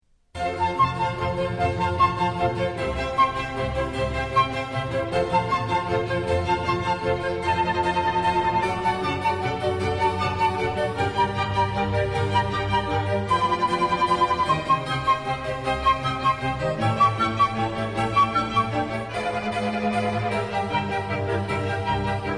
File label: Flute concerto Presto; La Notte; Antonio Vivaldi; Klasika